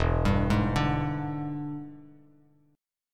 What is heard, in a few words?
E 7th Sharp 9th E down Bass Standard; E7#9 arpeggiated; JGuitar